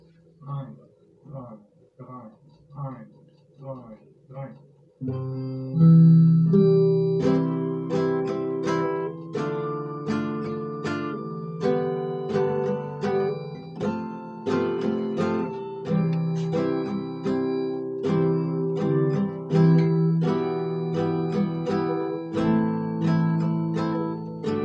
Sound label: chords